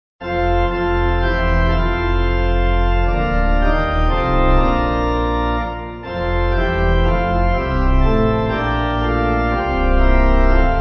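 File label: Pipe, hymn backing; Lord Jesus Christ With Us; Ach Bleib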